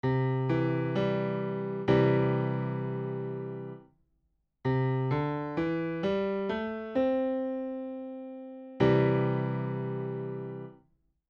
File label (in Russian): pentatonika mazhor zvuk; Мажорная пентатоника; Формируется из натурального мажора, исключая IV и VII ступени.; Пример: до – ре – ми – соль – ля.